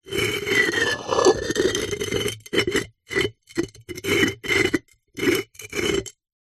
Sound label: household pot ceramic base lid scrape